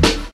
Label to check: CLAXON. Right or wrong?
Wrong